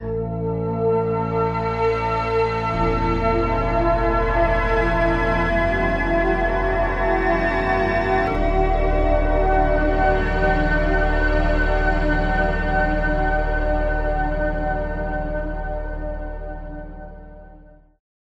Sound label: Oscillator PAD Soundscape Vibes; Alphatron; Syntheway